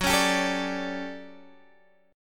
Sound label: D Major 11th Gb, chord